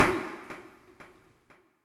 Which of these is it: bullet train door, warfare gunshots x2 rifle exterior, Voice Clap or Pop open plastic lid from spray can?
Voice Clap